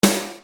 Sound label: Snare Dr